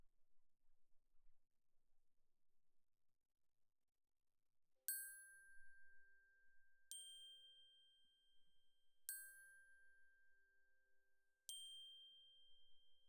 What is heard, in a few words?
Glock Close